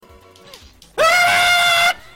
chaotic scream

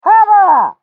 highfive; pyro

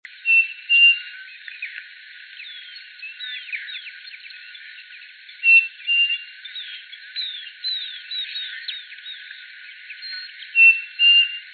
saci tapera naevia

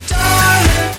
ringtone

